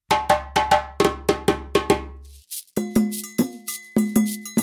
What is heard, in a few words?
kenkeni; sinte; rhythms